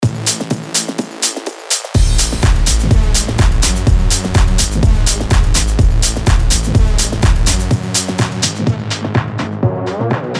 Tech House For Serum; Toolroom

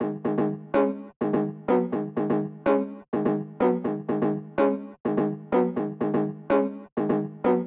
Stab G Hook

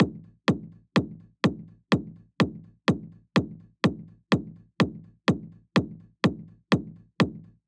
Kick

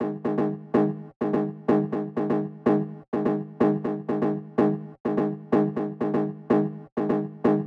Stab G Rhythm Long

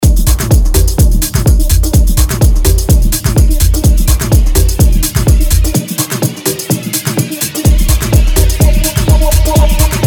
Percussive Tech House; Toolroom; Percussive Tech House Vol. 2

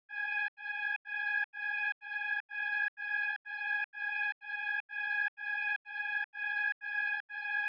HAMMOND PAD

